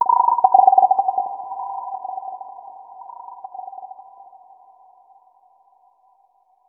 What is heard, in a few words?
Forest Amb Rand; moul